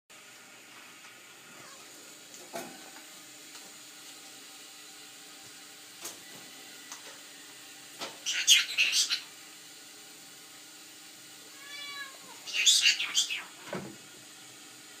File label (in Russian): kongolezskii popugai govorit, parrots; poicephalus gulielmi